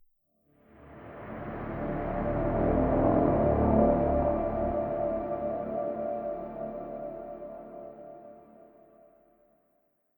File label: Exploration